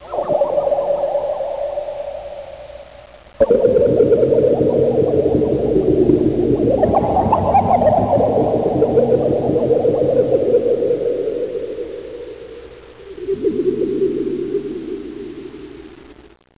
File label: Alien Tech